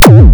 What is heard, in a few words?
Roland Sh-101